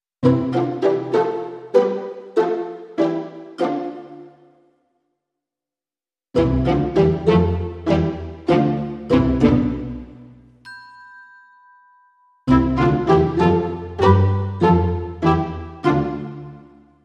Traditional Percussion Full

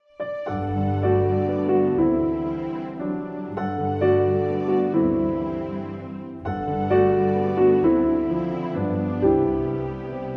With Strings

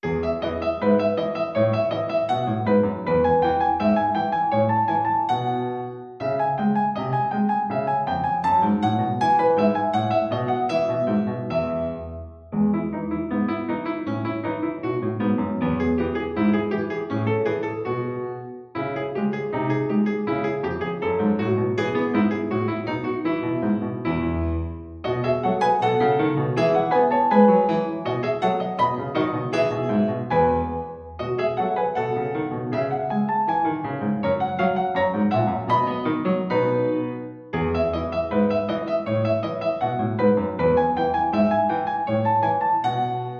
Piano

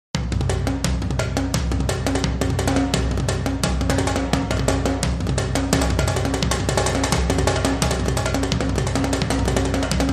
Light Percussion

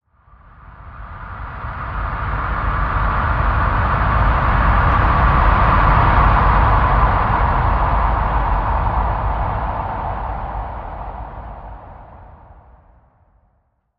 Sand Dune Winds Transition

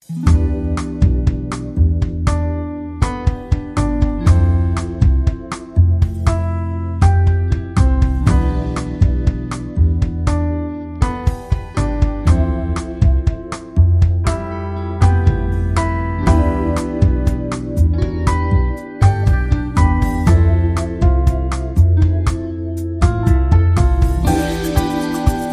Awaking Samba

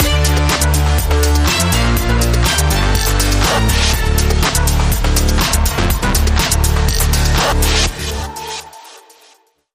Link Sting

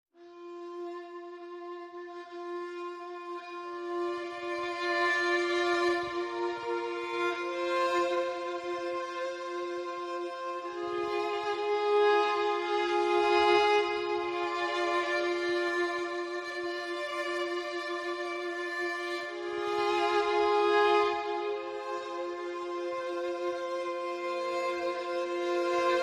Strings and Piano

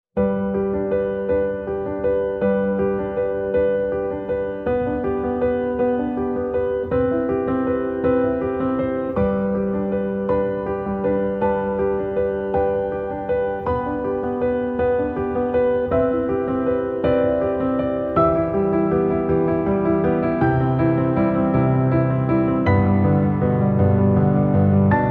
Yearning, Piano Only

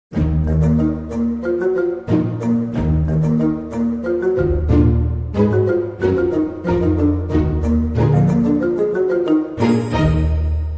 Dramedy Sting

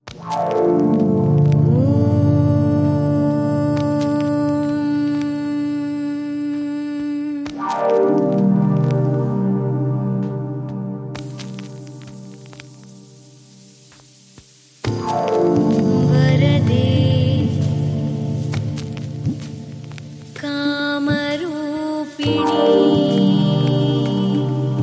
Deep Chill Mantra

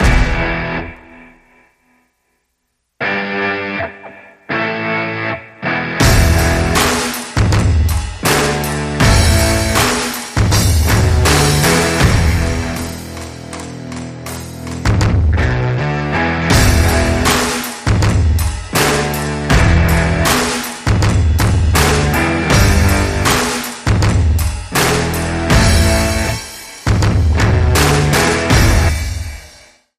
Unbeatable No Guitar No Vocals

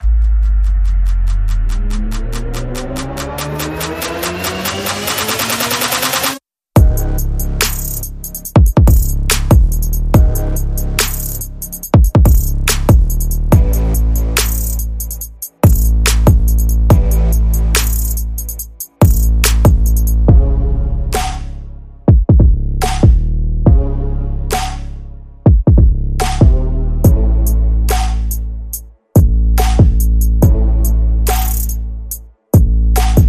Drums Only

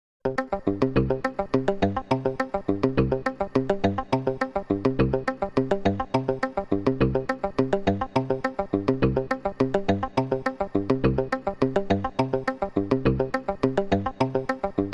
Rhythm

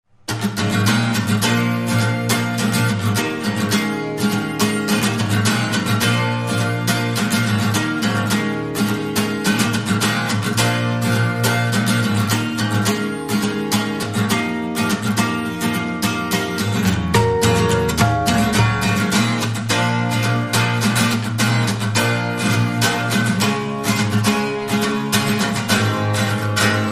Stone Rock Guitar Only

